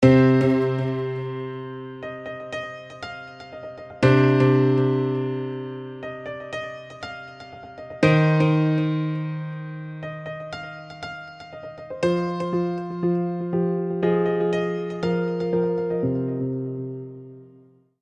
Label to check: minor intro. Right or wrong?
Right